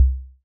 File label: quiet thud